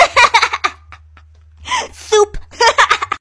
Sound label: haha